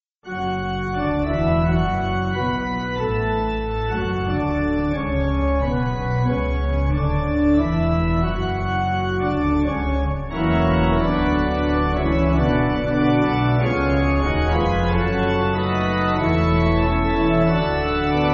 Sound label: Pipe, church music